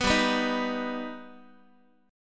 G Augmented, Guitar, chord; strummed; 14,15,14,13,x,x; 4A,4E,5A,5D,5Gsharp,6Csharp

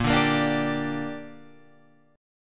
A sharp 6th, Guitar Open F; strummed; JGuitar

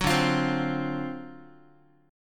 D 7th Sharp 9th, Guitar, chord; D7#9 strummed; JGuitar

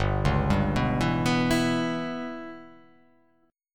G 6th Flat 5th

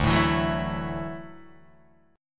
D-Major Add 9th D down Guitar Open D

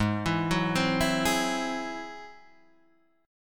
G sharp Augmented 7th G sharp Guitar Standard; G#+7 arpeggiated; JGuitar

